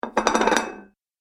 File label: Medium Side Plate Rattles When Put On Table